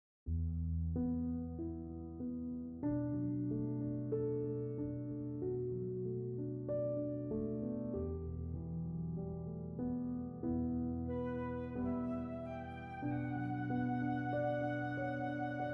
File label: INSTR